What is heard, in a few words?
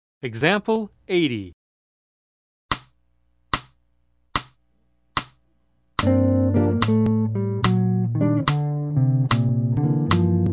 Jazz; jue shi ji ta